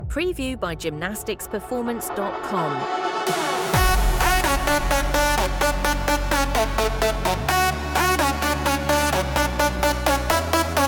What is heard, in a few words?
electronic dance music